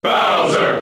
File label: Cheer English; Bowser; SSBB